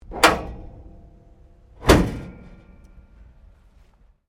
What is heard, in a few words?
CAR HOLDEN HD PREMIER CLOSE BONNET, Classic Australian cars